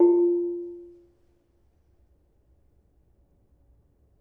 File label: Bonang Barung, gamelan